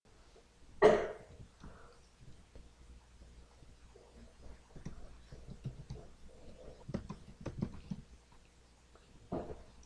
horn

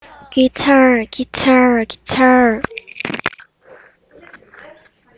guitar

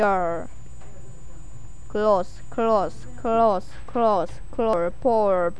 cloth

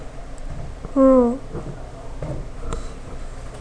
sweep

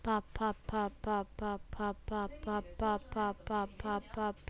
pop

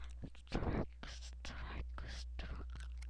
strike

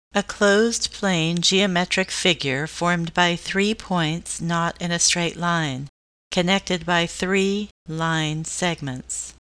triangle

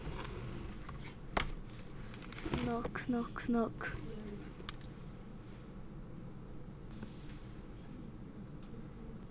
knock

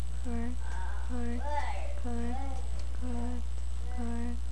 correct

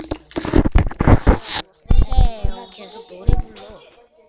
cow

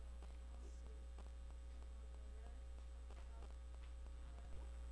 correct

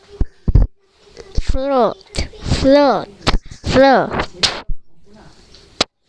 flute

